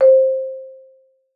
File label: MARM C5, MARIMBA; Xsample VOL-4